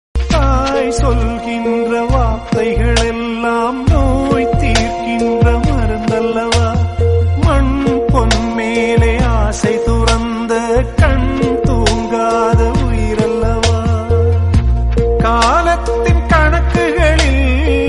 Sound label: tamil heart touching song